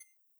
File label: Soft; UltimateCleanGUIPack